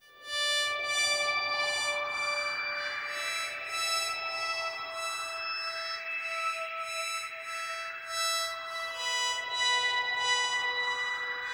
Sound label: SYN, Hip Hop and R&B; Best Service; ProSamples vol.01; AKAI